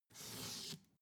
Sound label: take book soft short